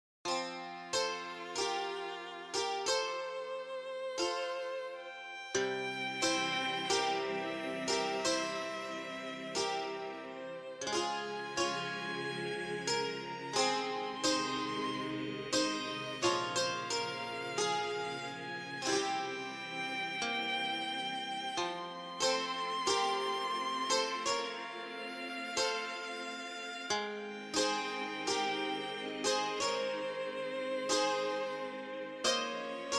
Chat Room; Hello Kitty Online; Moscow

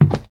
Wood soft

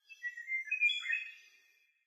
Amb Jungle Birds

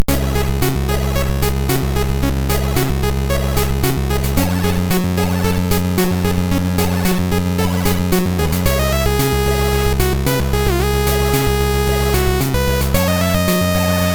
Intro Music; toki tori; gb

